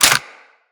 Weapon Jam Hit; Weapons; Grineer

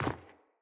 Sandals Land Rock, Footsteps; Player

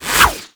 Baton Whoosh; Warframe; Lasrian Soldiers; Weapons

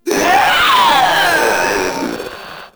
Proto Infested Runner Vocal Attack; Warframe

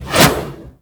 Scythe Whoosh; Reaper

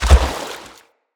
Gallop Water, Animals, Foley, Steps; Bromius

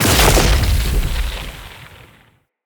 Ground Slam Impact; Enemy; Abilities; Nimrod

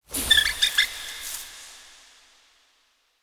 Hare Mote; Animal Motes

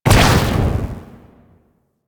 Amp Barrel Fire, Amplifiers; Warframe; Operators; Corp Amp Set One; Barrels

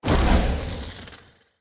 Casting Device Charge Heavy Start; Weapons; Player; Fenn; Casting Devices

